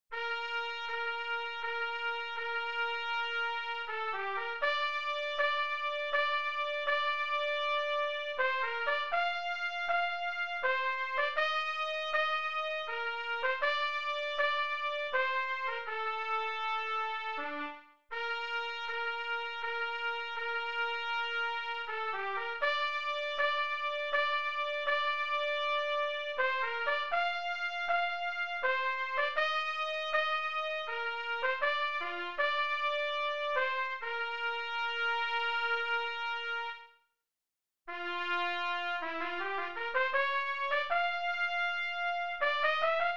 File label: trumpet